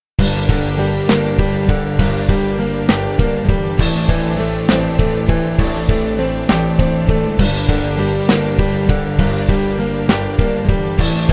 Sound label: Rock Tracks